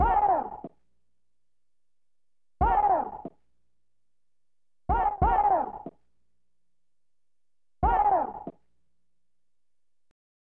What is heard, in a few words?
hit 92 Ab; Tantrum